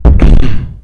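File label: fell; Extreme Sprint 3010; PS2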